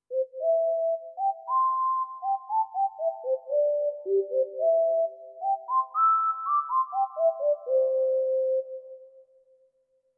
Whistle

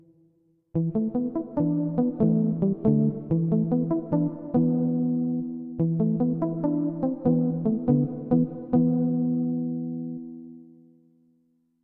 Funk Guitar

